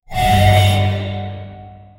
dark short intro teleport

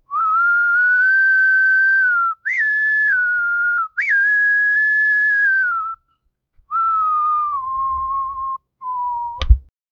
whistle

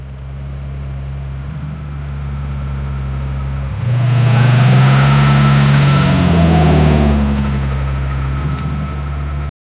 v8 engine in a monster, truck

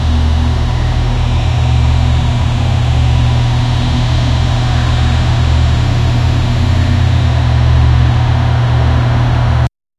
vehicle garage ambience

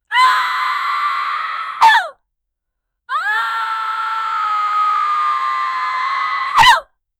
female warrior battle cry

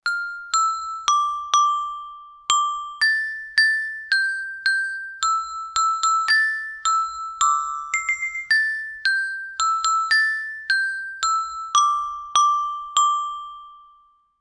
phone ringing melody usin, bamboo

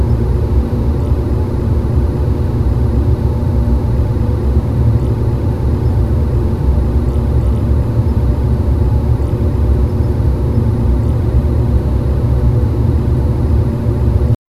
factory sounds

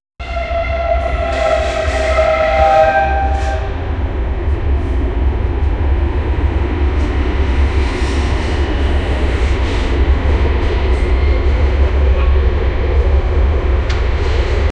calm noise of the train, indoors

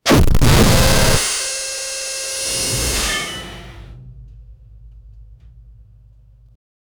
latch clunk and sharp bur

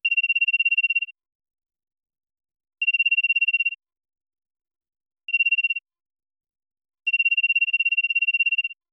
robotic ai notification

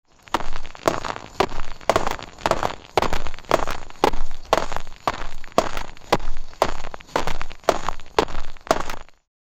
realistic battlefield

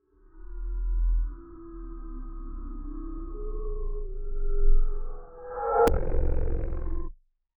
corrupted inhuman laugh i, glitch

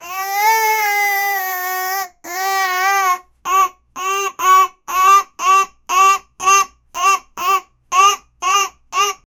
a baby tyrannosaurus rex, dinosaur

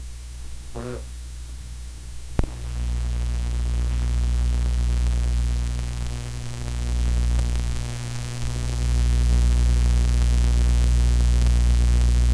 low level broadband hiss, analog

